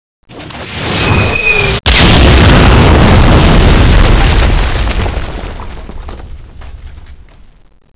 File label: mortar